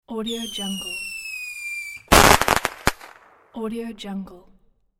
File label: petard